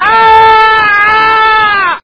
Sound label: fox ahh